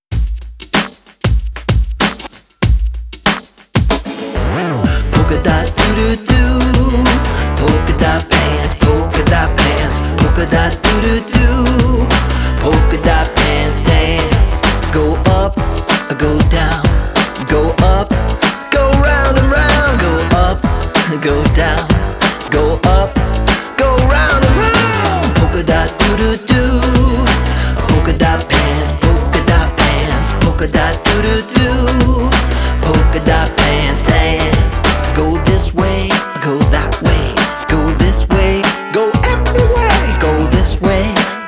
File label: Polka Dot Pants Dance